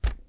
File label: knock